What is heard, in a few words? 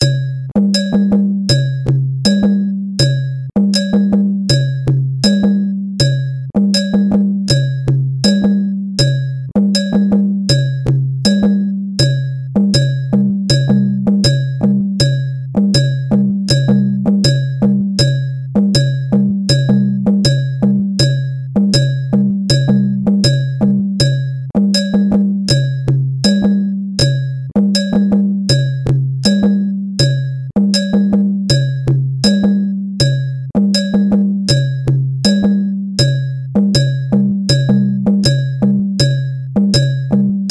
Fanga 080bpm, training loops; Ayoub